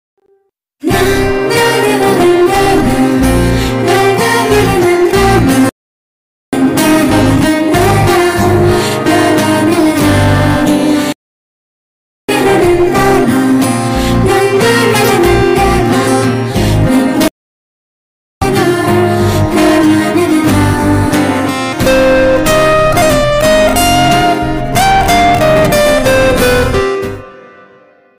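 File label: background music ringtone; raja rani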